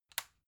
magnetic plug soft, elektronik; objekte

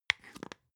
spice jar smooth close creak; industrie wirtschaft; gastronomie

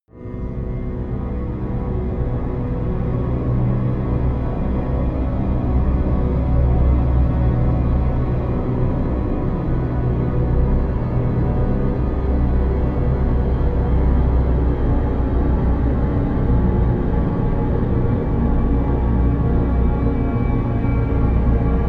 drone falling

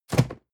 cardboard box medium place slide hard; objekte

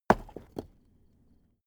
stone small impact

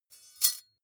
midsize metal thin clank drop ring

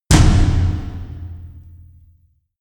huge tom hits

